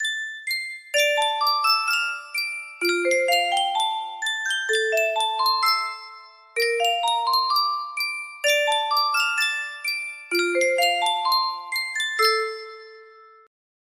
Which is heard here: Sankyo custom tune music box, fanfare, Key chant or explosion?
Sankyo custom tune music box